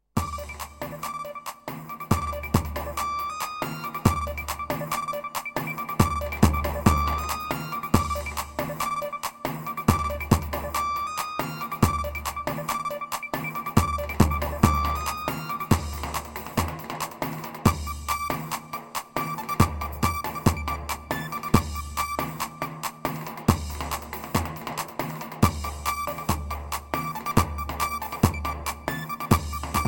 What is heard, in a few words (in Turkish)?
pa-500 tr roman ritim